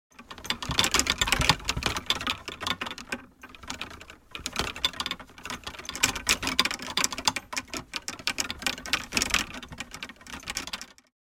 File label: Shaking of tour bag golf clubs movement